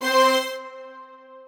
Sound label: strings